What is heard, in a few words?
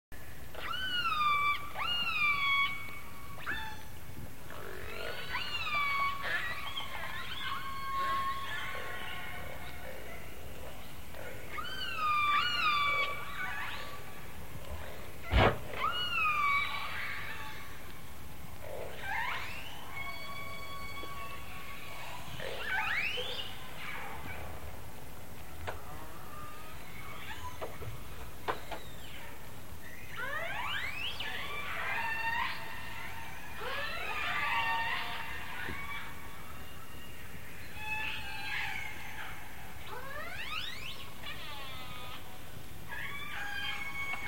Resident Orca All Clan A Clan